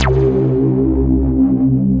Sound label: Synthesizer Middle C Notes, Lead